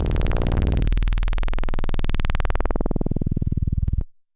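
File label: DX100 D#0; rocket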